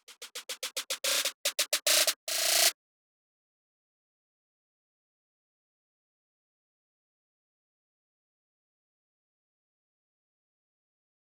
BPM72 Snare Roll

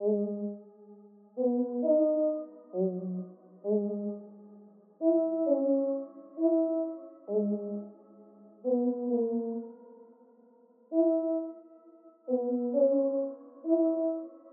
132, Dark Pluck Filtered, E

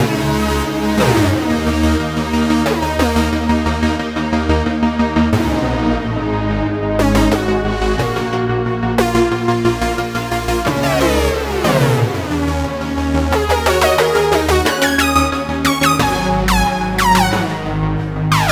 90 synth saw city Ebmin

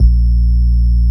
Bb Sub Circuit Clean Bass

